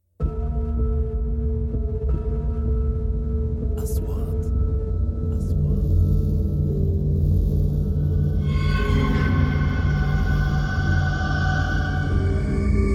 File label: horror